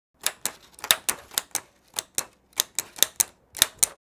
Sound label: Paper hole puncher punching paper